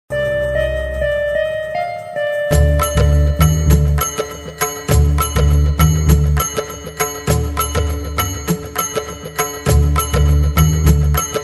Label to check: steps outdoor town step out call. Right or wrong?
Wrong